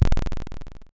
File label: impulses; Sound FX